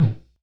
Bonk Mid Slow Kick; Airwindows

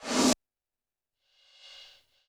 Roland TD-20 Percussion

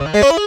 Rise Up; Zero-G Jungle Warfare 1, 2 & 3; 90 s Sample CDs

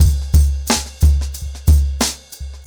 Nuclear 90BPM, ACOUSTIC DRUMS; The Roots Drumloops

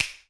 Powr Snap, Alesis D4 Drum Machines

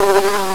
Bumble, Animals; FAIRLIGHT CMI IIX

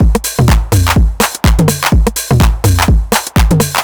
drum loop xox full 125, Classic Chicago House; Sample Magic; Drum Loops

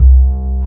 fat double bass; Jungle

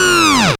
fx, f#, Rave FX; Synths

